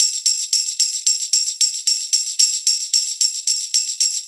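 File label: percussion, Roots Funk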